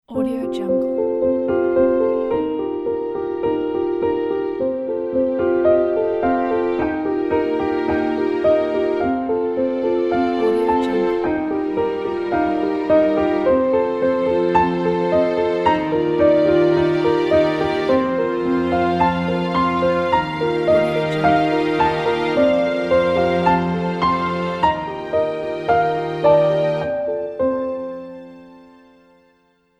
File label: emotive piano and strings ident